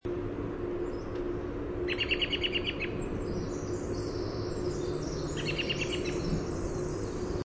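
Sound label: American Robin chirr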